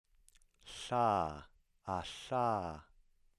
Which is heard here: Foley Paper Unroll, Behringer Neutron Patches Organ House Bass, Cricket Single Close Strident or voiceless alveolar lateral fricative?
voiceless alveolar lateral fricative